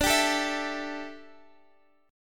D Suspended 2nd Suspended 4th D, down, Guitar Open F; strummed